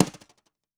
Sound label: Steel Brush Drag; Drums; Ghosthack - Free Snares 2023